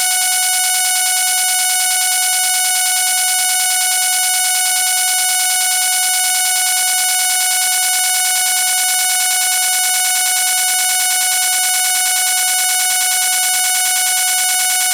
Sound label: MCI-500 DATA